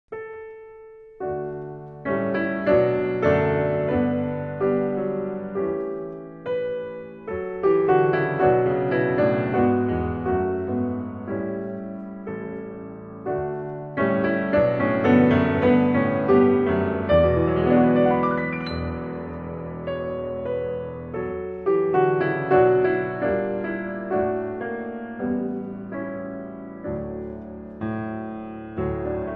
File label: Sacred Arrangements For Piano